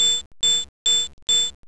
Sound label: ping steady